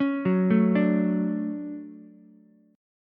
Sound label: D-Major 7th, D, Ukulele; arpeggiated; JGuitar